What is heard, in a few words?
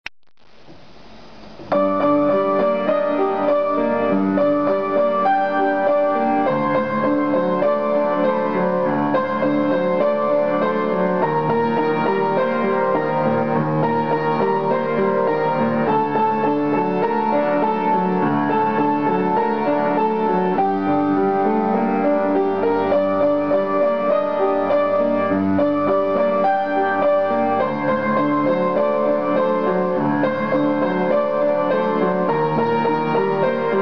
Tango Piano